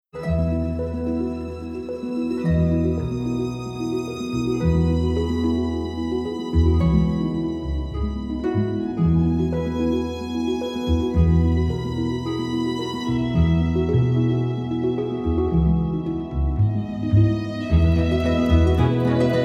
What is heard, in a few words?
Magical strings ethereal